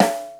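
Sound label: snare hip, Yamaha Motif ES drum machine; 300 Drum Machines; Yamaha Motif ES-Series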